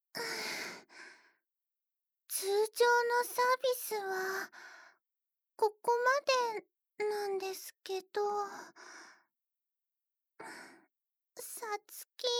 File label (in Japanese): 耳舐め乳首舐めご奉仕合戦, ASMR